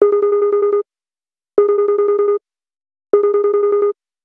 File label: ringtone telegraph; The Light Phone II